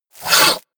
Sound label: sandpaper grind